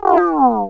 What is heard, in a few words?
hit; breakout